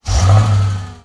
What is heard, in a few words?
engine start